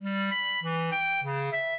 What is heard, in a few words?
minuet, clarinet; Mozart